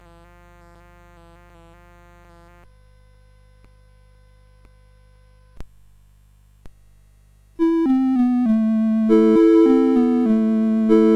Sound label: Piano Concerto, CSG8580R5